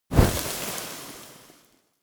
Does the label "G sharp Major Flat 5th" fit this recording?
No